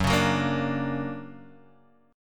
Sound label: D Augmented Major 7th F sharp, guitar